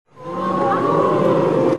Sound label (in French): Huée